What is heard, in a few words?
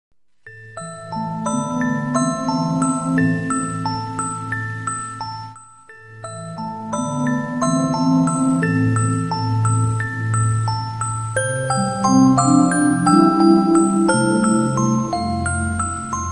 The Music Box; Police Quest III The Kindred; Amiga MS-DOS Windows